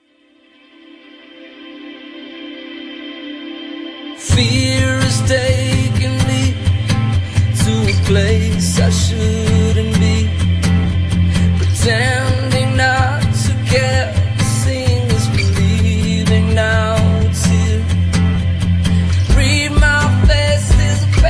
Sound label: acoustic version